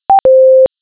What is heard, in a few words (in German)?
negativer Ton; Signaltoene